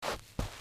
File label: step sand